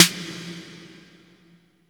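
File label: reverb snare; East West - Phat & Phunky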